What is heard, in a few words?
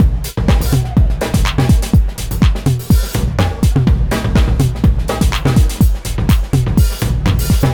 Dance Drumloop; Drums; Dance Beats